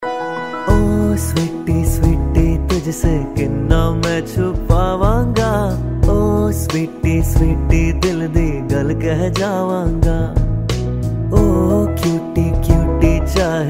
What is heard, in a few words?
hindi song tone ringtone, bollywood